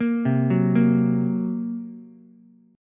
Bb Suspended 2nd Sharp 5th, Ukulele; Bbsus2#5 arpeggiated; 3,0,2,1; JGuitar

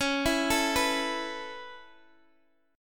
C sharp Minor 7th Sharp 5th, Guitar DADGAD; x,x,11,9,12,9

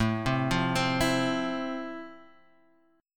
F Major 7th A

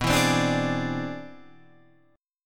C Augmented Major 9th B

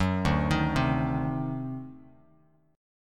D 7th Flat 9th D down Bass Standard; D7b9 arpeggiated; JGuitar

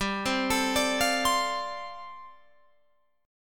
G 7th Suspended 2nd Suspended 4th, Guitar Open F

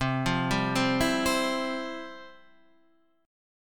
F Major 7th Suspended 2nd, C, guitar

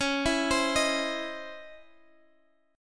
Db Minor Major 9th Db Guitar Half Step Down; DbmM9

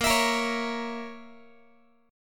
A sharp Diminished Guitar, chord; strummed; JGuitar

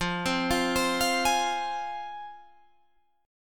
F Minor F down Guitar Drop D

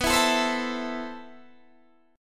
A Minor B, guitar; Am/B strummed

